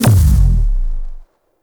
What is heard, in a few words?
Stun Ability Cast Hit; Warframe; Helminth Abilities